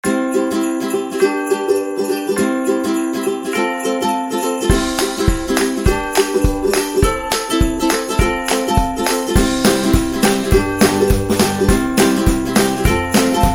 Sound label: Winter Fun, christmas